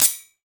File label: Grill Pan, household rhythm samples Hits